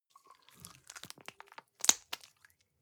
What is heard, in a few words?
gel dispenser press squish, badezimmer; haus garten